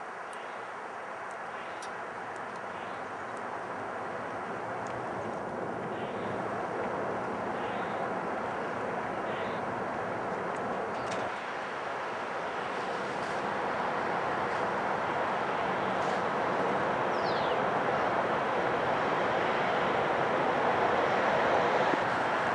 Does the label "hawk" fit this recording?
Yes